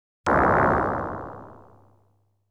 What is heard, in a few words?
Flame Shot